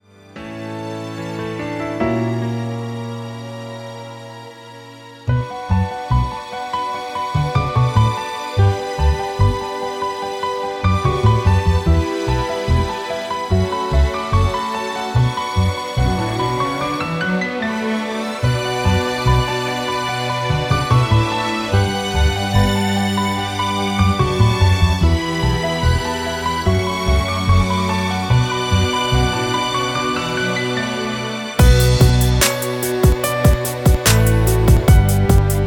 Instrumental soundtrack of female singer without lead vocal or backing vocals